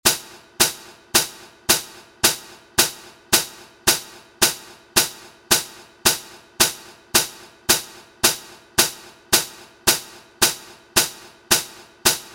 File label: cymbals, drumline